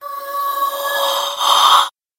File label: monster spirits